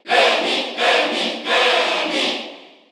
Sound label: Cheer Korean; SSBU